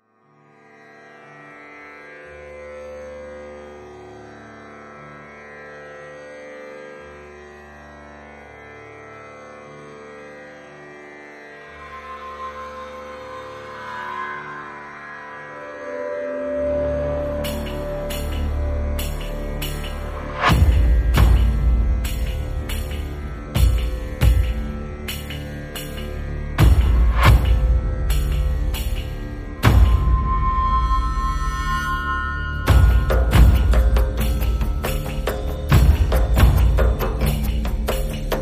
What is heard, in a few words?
No Pulses No Melody